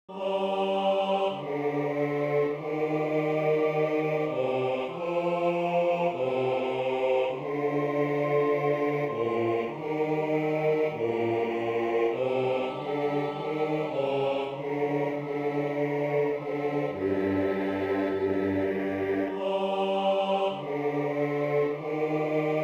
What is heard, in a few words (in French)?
basse; Ignudi